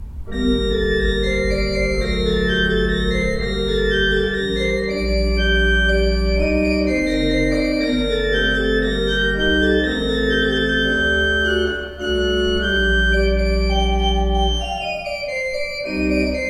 Van Rossum, pipe organ

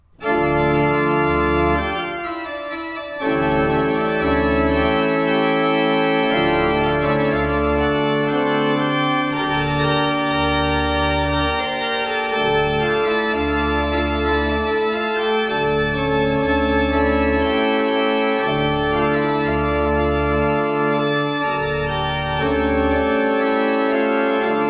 Rohlfing Kreienbrink, pipeorgans

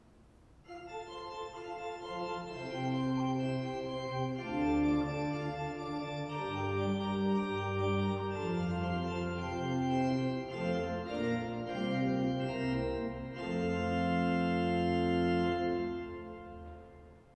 Seifert, pipe organ

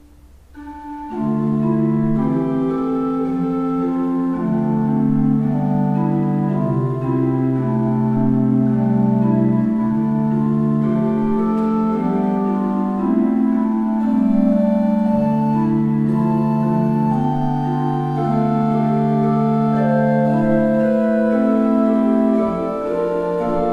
Ott, pipeorgans

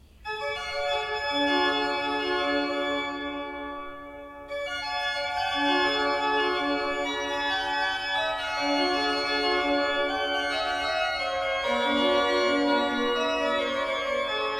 pipe organs; Schuke 30 III P

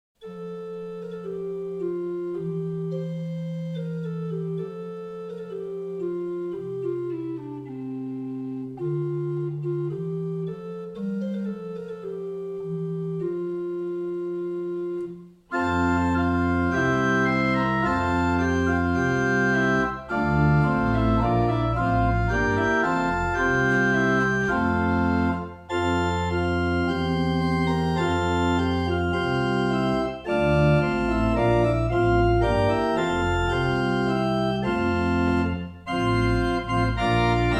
Oberlinger, pipe organ

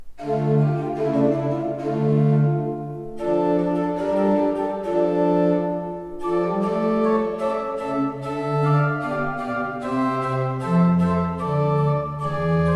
Ott, pipeorgans

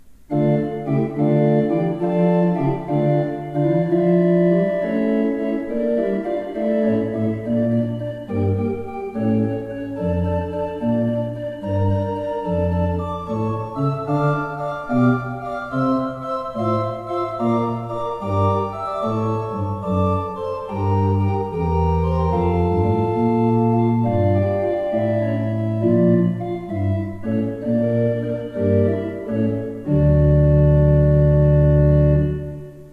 pipe organ; Tzschoeckel

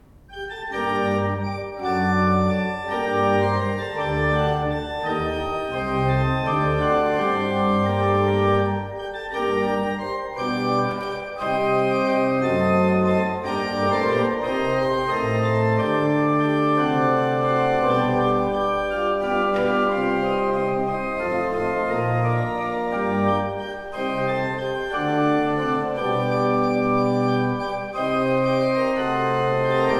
pipe organs; Paul Ott 11 II P

